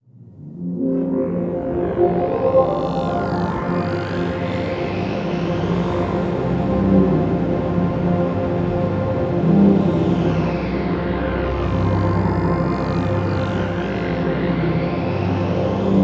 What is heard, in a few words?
Low Complex Riser; Sonic Foundry (Sony Creative Software) - James Johnson Spektral Minimalism